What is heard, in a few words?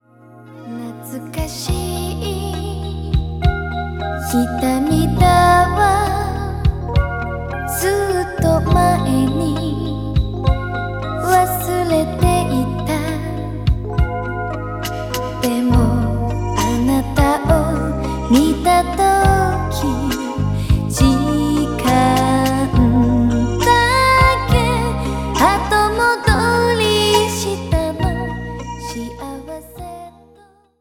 female vocal; 邦楽・女性ボーカル; 女性ボーカル02